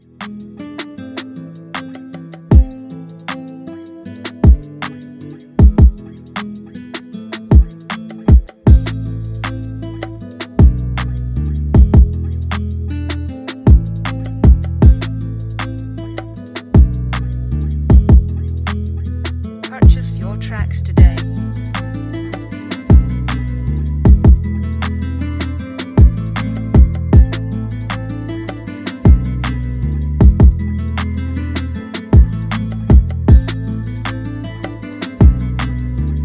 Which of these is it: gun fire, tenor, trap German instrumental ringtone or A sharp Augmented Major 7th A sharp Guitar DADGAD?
trap German instrumental ringtone